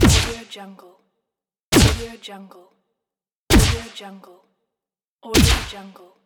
magic blasts

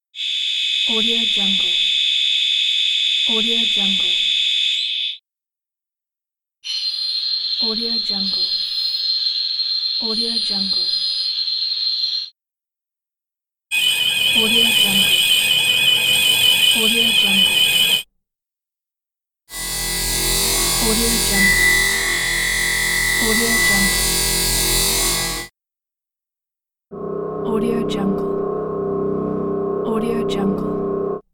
lab lasers

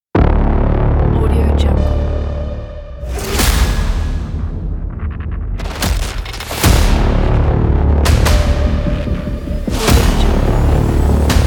aggressive dark trailer

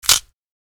watermelon rind snap; hollywoodedge

